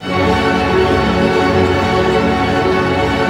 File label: ARP F#, STR ARP MIN; Sound On Sound - Peter Siedleczek's Orchestra